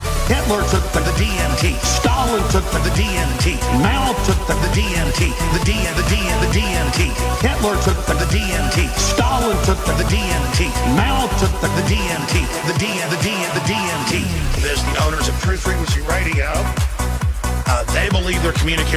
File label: Ringtone Electro House Remix; Alex Jones DMT